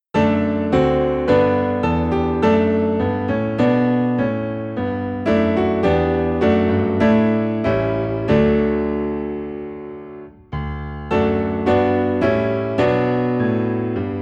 Piano Low in D

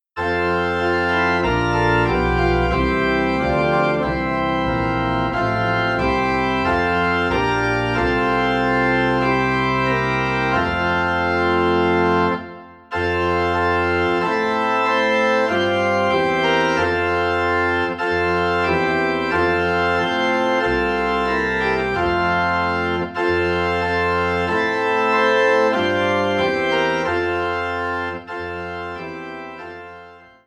Organ in F; Angels We Have Heard On High; christmas

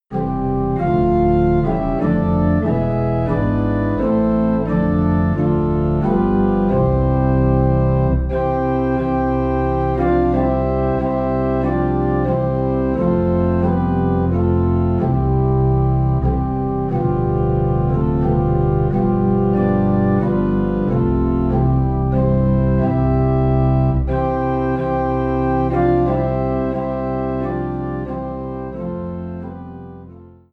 Away in a Manger - Organ Low in C; christmas

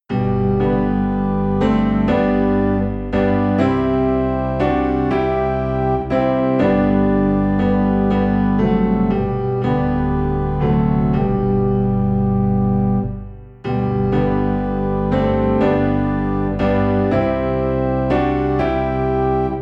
Piano and Organ Low in G; Sweet Hour of Prayer

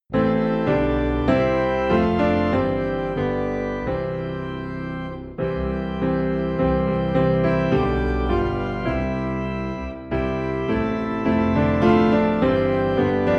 Piano and Organ Low in C

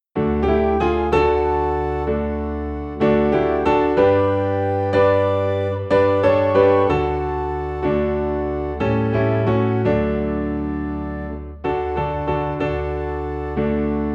Piano and Organ in D; Blessed Redeemer

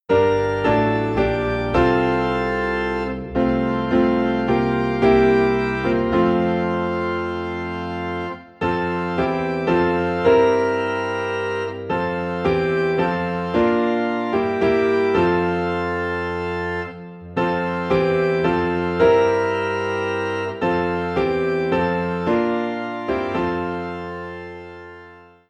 Piano and Organ in F; Be Still My Soul

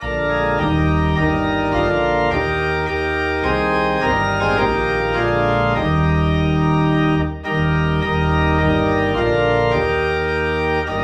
Organ LOW in E Flat

